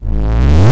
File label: BD Distortion, Bassdrums; Vengeance Samples